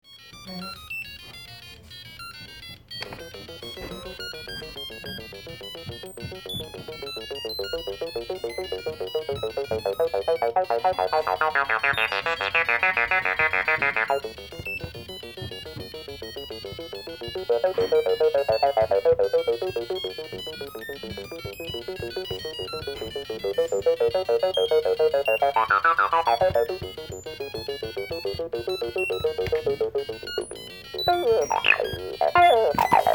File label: trs80 beeps